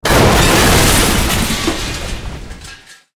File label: tanks explosion metal; explosions; War Thunder